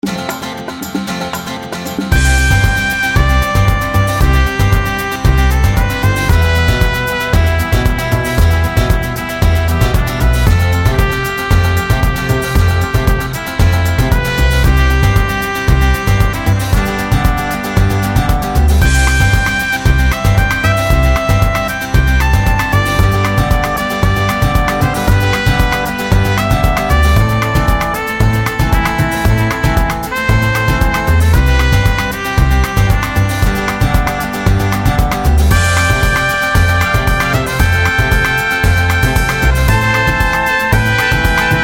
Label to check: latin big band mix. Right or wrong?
Right